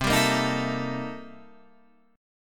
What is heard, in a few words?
C 13th Sharp 9th C down Guitar Standard